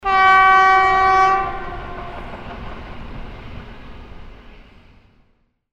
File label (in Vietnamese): Tieng coi tau khi o xa